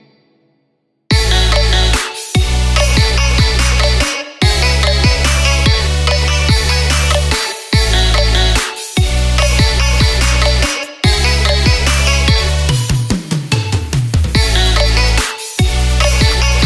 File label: TRAP future bass, ringtone